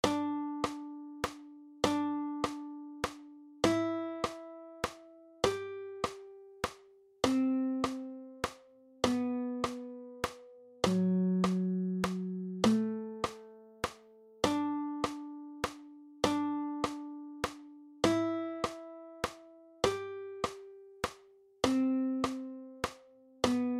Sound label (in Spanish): minuet soprano